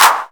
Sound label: Claps; Vengeance essential club sounds-3